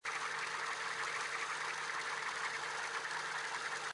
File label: REEL SPIN; Golden Barnyard